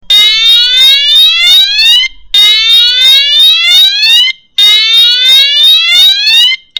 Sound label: Air-Raid Alarm Sound